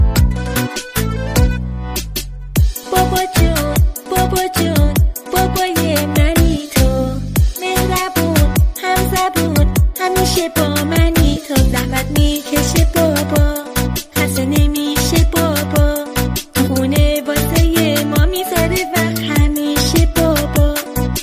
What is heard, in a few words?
fathers day music